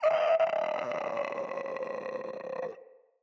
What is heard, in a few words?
Infested Runner Death